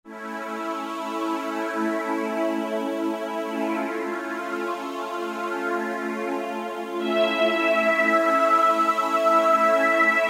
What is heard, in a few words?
3D Moving Pad; Roland SRX-03 Studio SRX